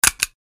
Unlock; TCL; M2L

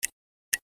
Ticking Clock, notifications; Samsung; Galaxy Watch 6

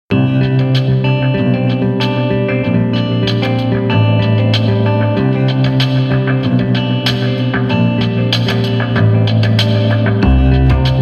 Latin Quarter; Nokia; Nokia Tones; Windows Phone apps

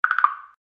Wood, notifications; Infinix; XOS 10

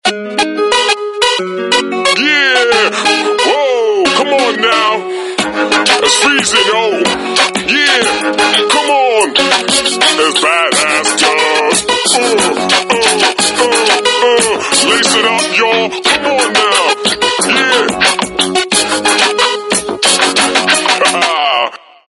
Ringtone, Samsung GT-C3110; Southeast Asia

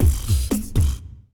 Beat Lock; Pantech; Discover P9090

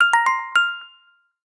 Crystal, notifications; Rollable LM R910N; LG

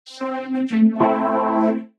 Brass; CyanogenMod exclusive ringtones; CM10; Android

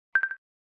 #, keyboard tones, Xylophone; Samsung SGH-E500

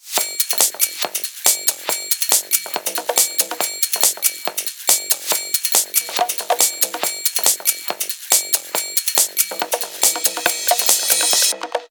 Drum Roll; Motorola; Atrix-4G

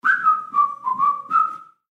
Whistle; Aio Wireless

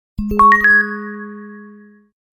Message, Digital; Oro

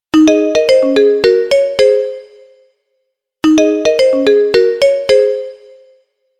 call Incoming, ringtones; TCL; L10

